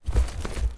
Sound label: run